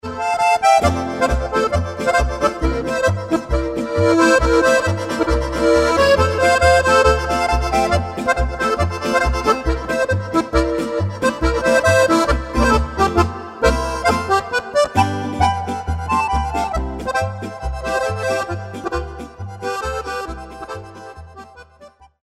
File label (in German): jubilaeumspolka